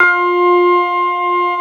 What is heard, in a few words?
CX3 ORG F4, Keyboards Old School; USB Soundscan vol.09 - Keyboards Old School; AKAI